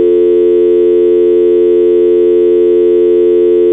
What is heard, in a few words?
phone dial tone